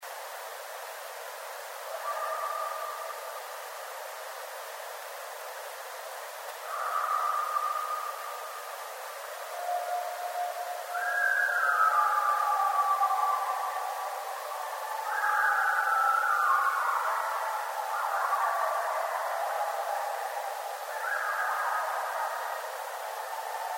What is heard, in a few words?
Coyotes; Coyotes (Canis latrans); night sounds